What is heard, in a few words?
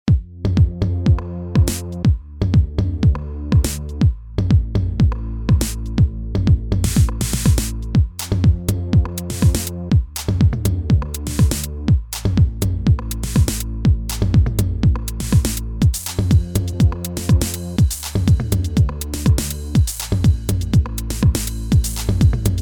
TR909 Drum The Beat; Niche